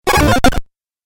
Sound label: Retro Glitch; Glitch Sound Effects; sound design